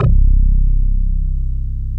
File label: BASS; ZERO-G; Datafile